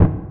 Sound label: hit, sfx; timber